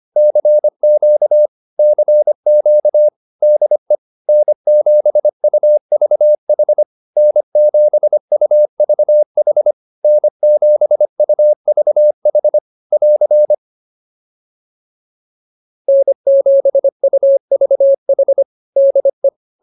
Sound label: QSO, intermediate practice files